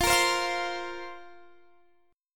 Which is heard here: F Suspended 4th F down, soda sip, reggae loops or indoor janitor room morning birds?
F Suspended 4th F down